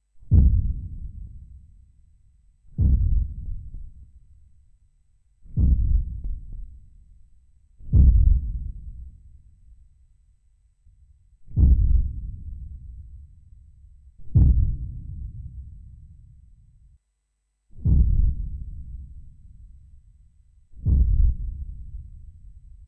Deep Impact

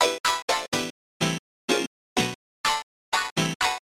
House Piano 125 Bpm

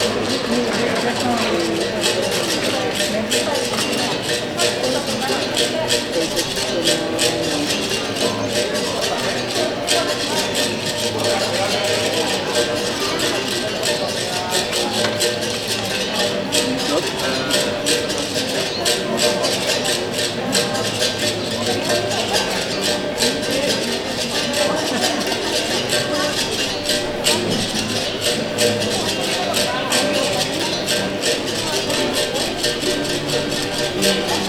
Berimbau

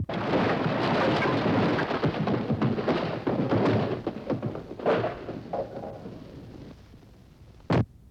Crashing Collapse Of Wood Building

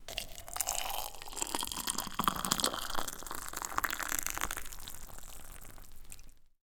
Pouring Boiling Water Into Cup

